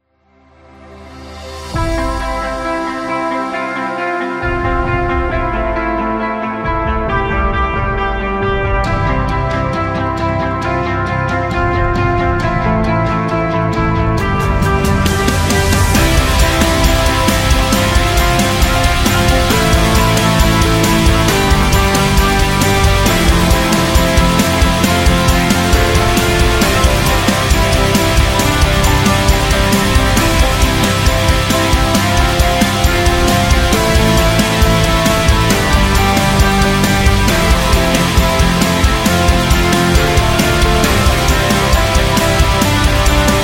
Rock; business background

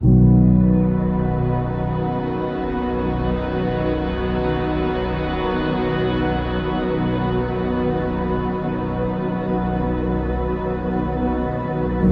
Peaceful Dawn Meditation

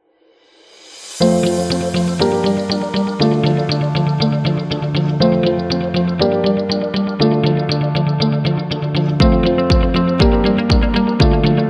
Corporation; corporate interview

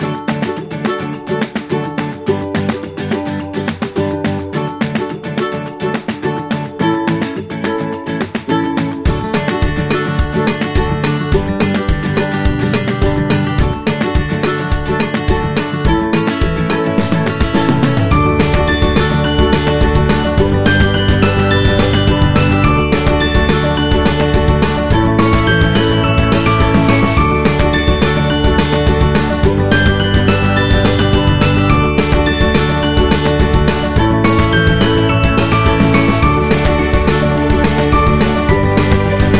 Cheerful; happy music vol 2

